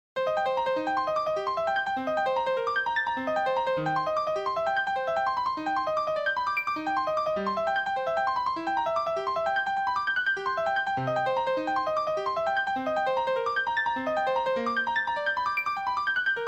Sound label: piano improvisatio